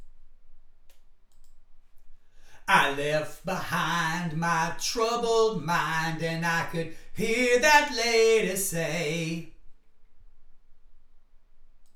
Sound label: vocals